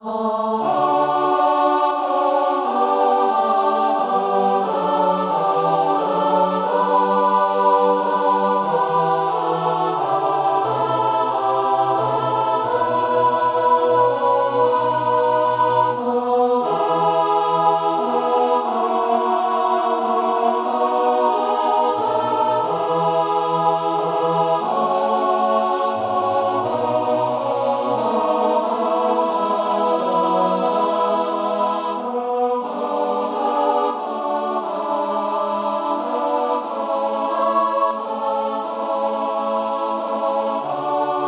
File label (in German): gemischter Chor dreistimmig